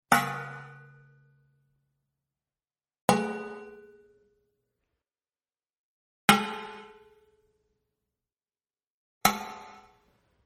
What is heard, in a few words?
Metallic Hits High, High Pitched Metallic Hits; 4 Takes; Hit Sound Effects; Horror